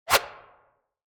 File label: infographic swipe